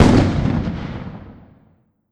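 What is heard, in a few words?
Kamikaze Explosion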